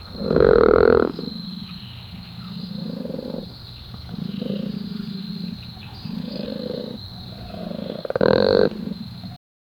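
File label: american alligator growling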